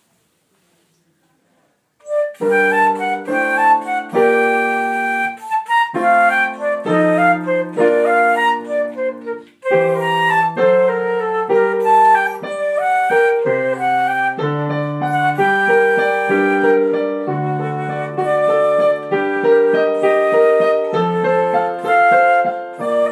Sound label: Gigue by Corelli